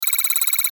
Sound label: Text Readout; Digital; Sound Design; Multimedia; Blastwave